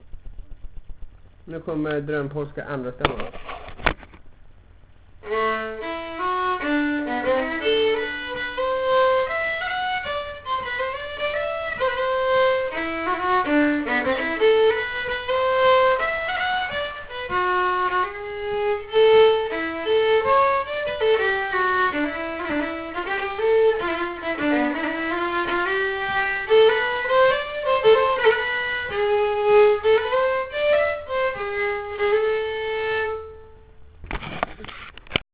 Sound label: polska